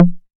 808 SD Tape Sat, Snare Drum; Tape808; Goldbaby Tape808 & Tape909

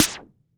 amiga8 snare; Polyend; Legowelt

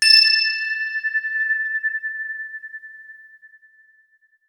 bell high; Sonic Foundry (Sony Creative Software); Ugly Remnants VOL-2

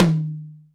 HR16 TOM; Crypton - DrumBase5000

